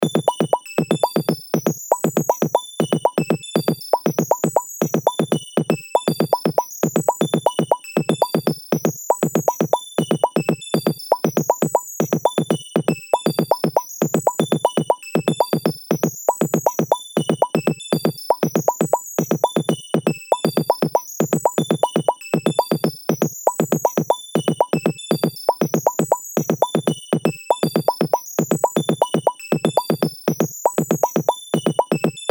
BEAT BRIGHT, Beat Loop Bright Crystal Shimmer Electronic Pop; Miscellaneous Loops; Blastwave